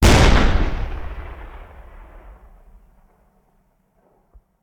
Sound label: Cannon Tank; Sound Ideas; Guns